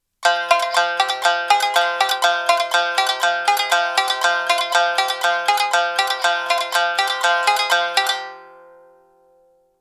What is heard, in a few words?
Rhythm 120BPM F, shamisen